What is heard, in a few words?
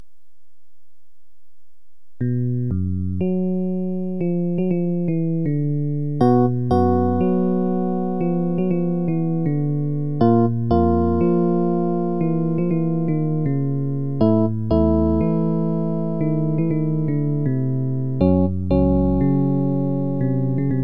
improvisation